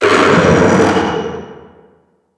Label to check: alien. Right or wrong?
Right